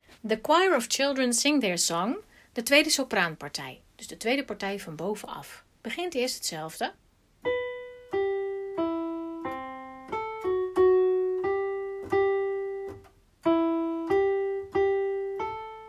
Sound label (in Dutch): the choir 2e sopranen; kerst